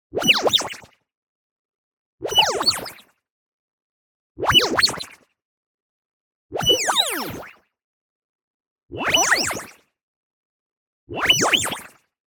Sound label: movement fast, two filters delay modulation; boom library; Anime Essentials; DSGNMisc